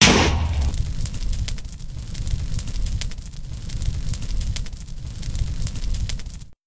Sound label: Fire Burning; Minecraft; explo firestar impact